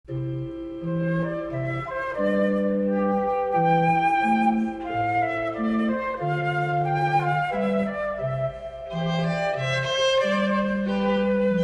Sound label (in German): Triosonate fur Flute Violine und Basso; Dolce; Telemann